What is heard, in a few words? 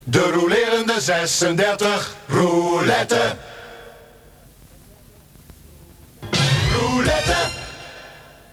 silence